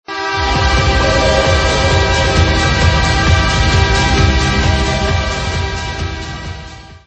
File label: Rexall Horn